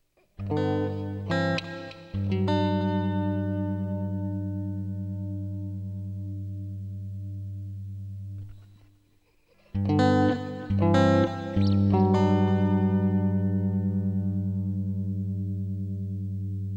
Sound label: Guitar Chords 5ths A